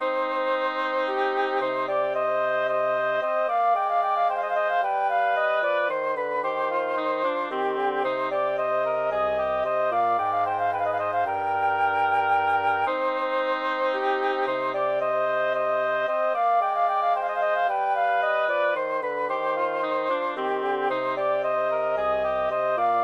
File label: menuett for woodwind trio; hotteterre jacques martin; from la noce champetre